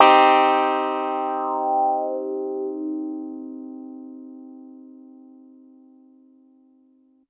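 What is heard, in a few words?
Piano Clav minor hard; TrashLibrary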